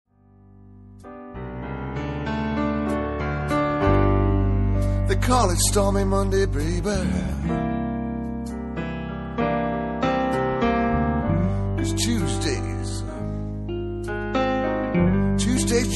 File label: Blues